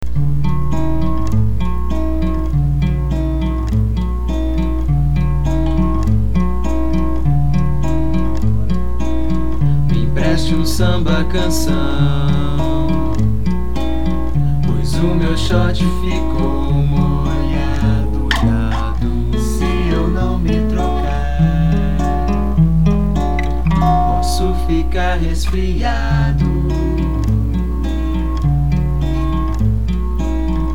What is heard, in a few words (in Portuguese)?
samba cancao